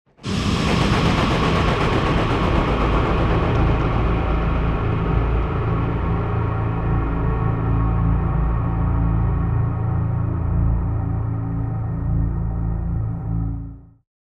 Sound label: String; Assassin's Creed Unity